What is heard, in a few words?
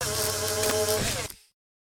Robot Breaking; Still Life; windows